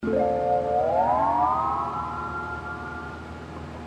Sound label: hawaiian piece